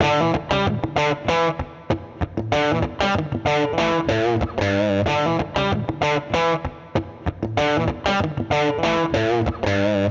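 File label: Guitar Electric Loop BPM 95 E minor FX; TRKTRN; Ghost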